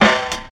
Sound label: snare sound g sharp key, steel snare drum sound tuned to the G# note. Loudest frequency: 1676H